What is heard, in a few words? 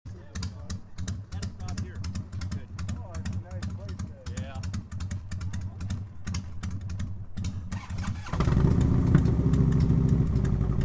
Two Harleys; tascam dr-100mkii